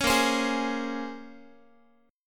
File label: A sharp Minor 9th, A sharp, down, Guitar; A#m9 strummed; 16,18,15,18,x,x